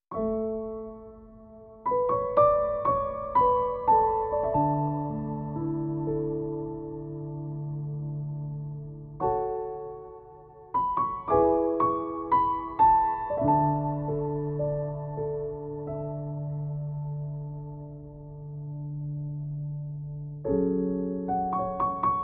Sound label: (Arr. For Piano)